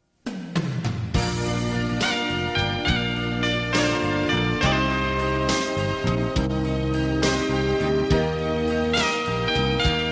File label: Hmong